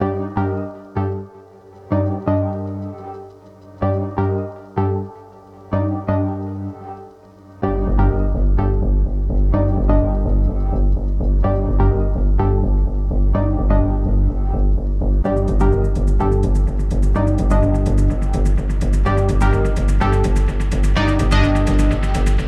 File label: Berlin Techno